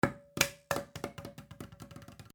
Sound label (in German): leere Plastikflasche